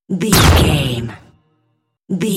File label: cinematic stab hit trailer